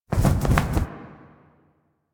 Owl Pre Hover To Hover; Duviri; Animals

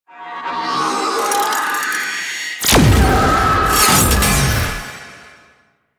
Laser Fire; Cinematics; Shrine Intro; Sentient; Warframe; Sacrifice

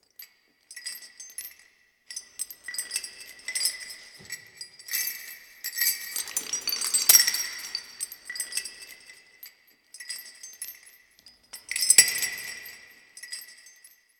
Sentient Throne Room Chain; The New War; Ballas

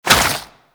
Infested Tendril Step, movement; Zeke; Warframe; Infested Lich

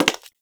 Human Loid Foley Step; Warframe

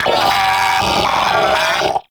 Arachnoid Vocal Death; Warframe; Corpus; Arachnoid Hunger

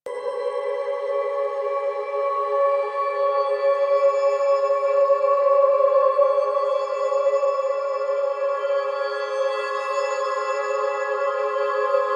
Caves Amb Pads; Dynamic Music

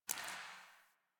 Int Water Drip; Ambience; Interior; Warframe; Orokin Derelict

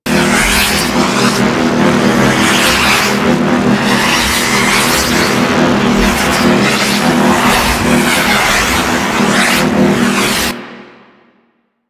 Power Tube Loop; Ambience; Props; Warframe; Orokin

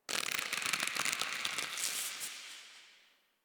Tendon Stretch Medium Echo; Ambience; Misc Outdoor; Warframe; Infested Eris

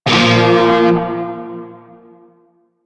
Shawzin Chromatic Chord K; Music; Warframe; Narmer Shawzin

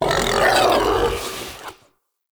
Battle Dog Death

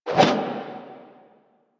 Melee Swing; Warframe; Caliber Chicks minigame

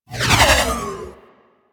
Ball Projectile By; Warframe; Missions; Tenno Ball

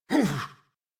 Pain, en; Warframe; New War; Teshin

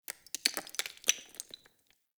Amb Ruin Stone Crumble; Ambience; Mendicant Area

